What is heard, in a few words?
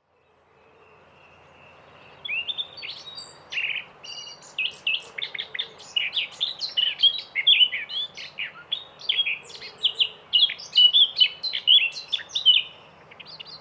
blackcap sylvia atricapilla